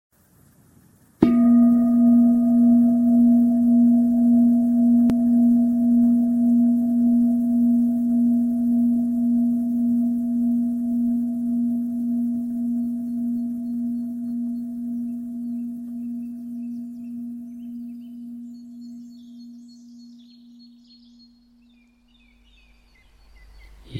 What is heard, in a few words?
room bird song; «Я» как пространство. Пение птиц. 23 мин.